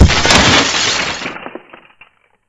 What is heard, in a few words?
bust glass